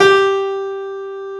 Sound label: PIANO G4; AKAI S-Series CD-ROM Sound Library VOL-8